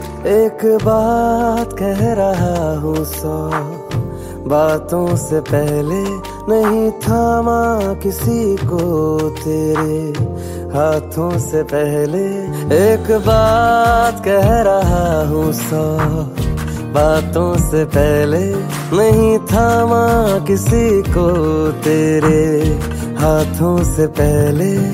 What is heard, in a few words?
hindi ringtone, bollywood ringtones